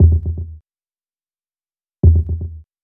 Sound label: Dark Beat, Dark Organic Processing